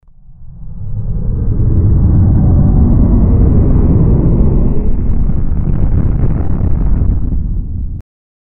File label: a spaceship entering warp speed with a deep rumbling sound